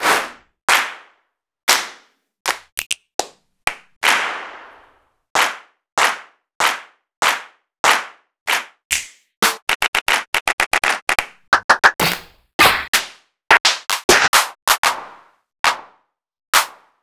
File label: Roland BK-5 Claps & Snaps; Sliced